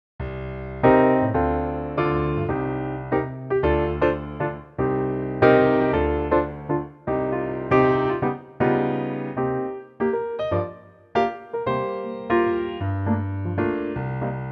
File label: Cm piano